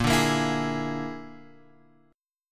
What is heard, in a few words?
A sharp 7th Flat 5th A sharp, Guitar Open G